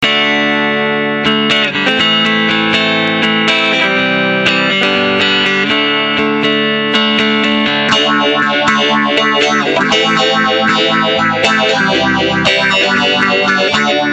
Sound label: phaser fast; vintage phaser; custom kit